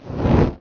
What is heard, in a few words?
special fireball start; Cinder